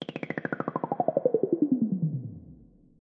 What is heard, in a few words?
crickety moog descends; Various Strange Sounds and Effects